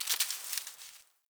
wetland forest day vegetation scurry; Ambience

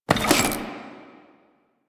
Sticky bomb Reload; Grineer; Veilbreaker; Vor

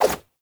Run Whoosh, Foley, Footsteps; Enemies; Warframe; Lasrian Soldiers; Lasrian Butcher

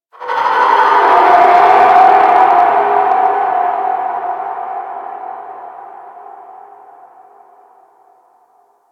Ambience Hub Red Veil; Warframe; Tenno

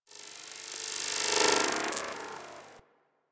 Resonant Energy; Ambience; Warframe; Corpus Railjack

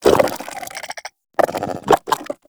Vocal Idle, Shrimp Lantern, Creatures; Baliq

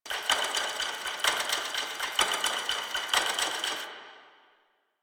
Last Portal Open Clock Loop

